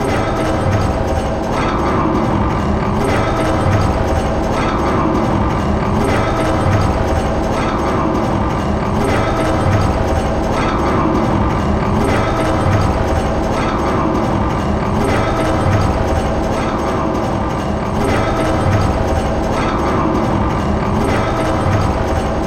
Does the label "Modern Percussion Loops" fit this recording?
No